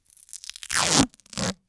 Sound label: Duct Tape Tear; 1001 Sound Effects